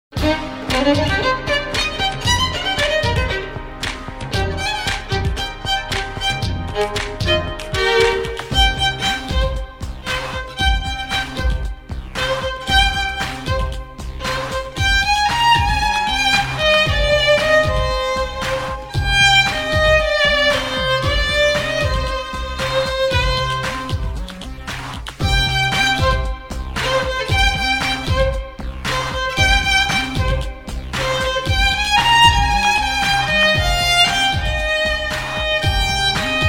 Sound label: Violin Cover